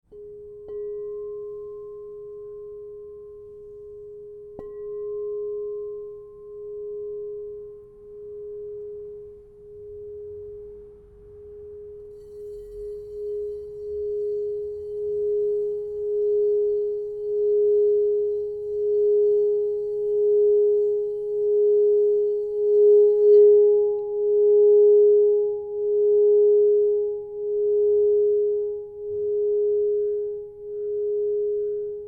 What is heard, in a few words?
Crystal Tones Singing Bowls